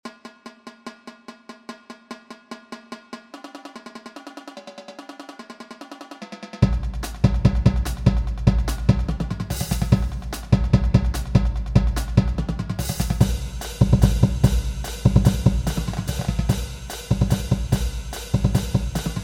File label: jig all Parts